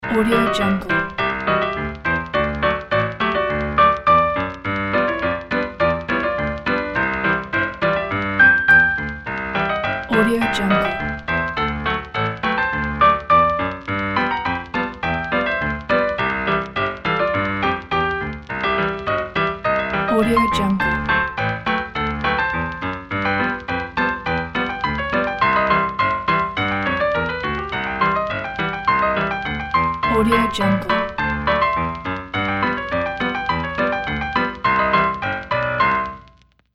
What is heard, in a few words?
retro ragtime loop